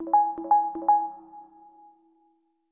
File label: Ring